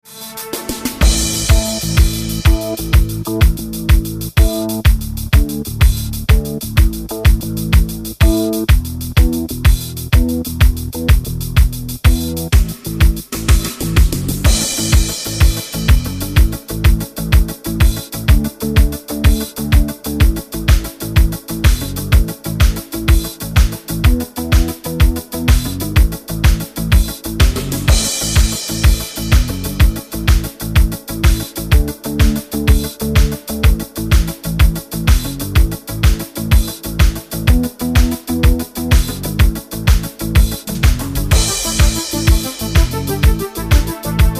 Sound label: Harmonica Talkover